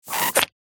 Empty gel bottle squeezing and release

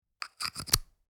Speaker connector adapter unplug; headphones and speakers

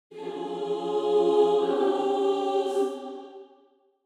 Angelic choir angels video game you lose

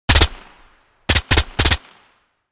MAC 10 submachine gun two three round bursts; war

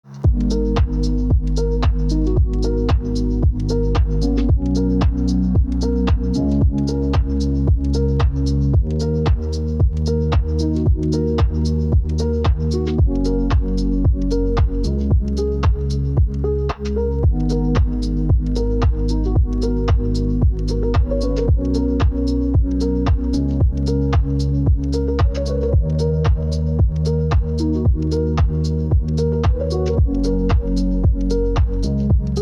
Lifestyle aesthetic

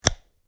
Electrical plug insert click plug in

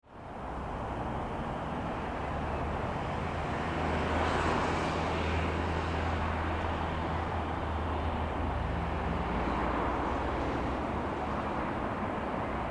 Suburban light traffic and street atmosphere

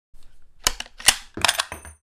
Sniper rifle round ejecting

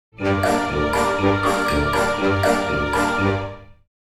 Cartoon funny transition comedy dramatic

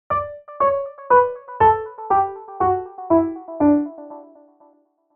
Synth and piano D major descending scale

